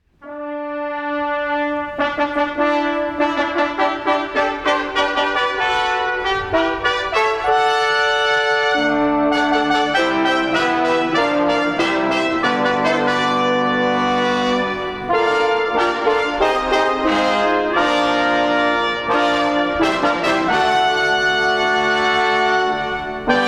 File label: Brass Fanfare; Live Performance